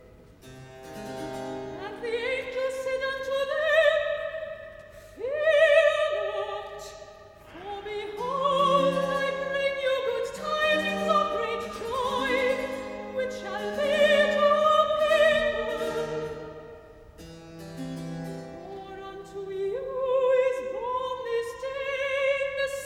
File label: Recitative-soprano; And the angels said unto them; Handel Masterworks; Klassika